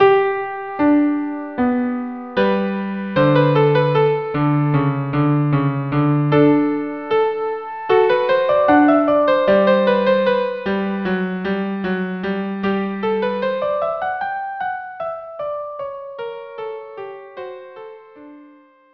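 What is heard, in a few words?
viola duo adagio; KV 487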